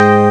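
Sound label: electric piano; Amiga Soundtracker Sample Packs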